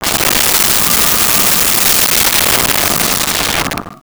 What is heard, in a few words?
Shuttle Fly By, Science Fiction